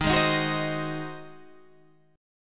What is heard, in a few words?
G sharp 7th D sharp